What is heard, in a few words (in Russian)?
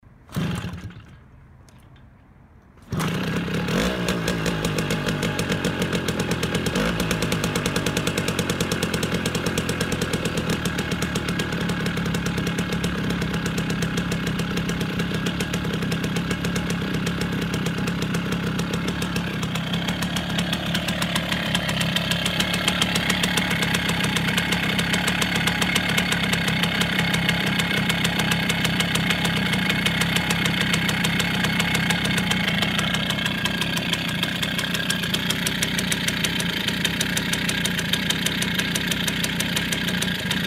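zvuk zavoda i rabota mopeda riga, sound of starting and running a Riga moped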